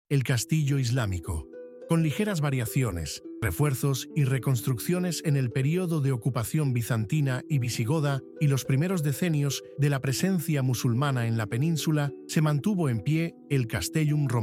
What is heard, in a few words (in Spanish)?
audioguia castillo música